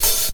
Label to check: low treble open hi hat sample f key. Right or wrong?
Right